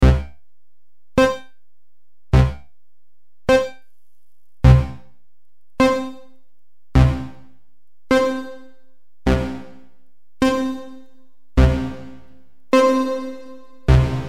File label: reverb